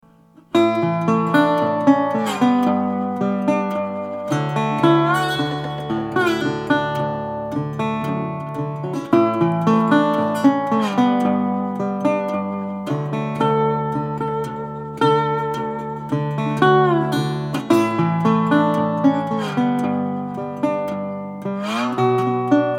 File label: Dobro Steel Nickel